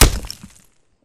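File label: Bullet Light Ballistic Dirt impact; Titanfall 2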